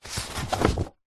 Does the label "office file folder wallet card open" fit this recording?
Yes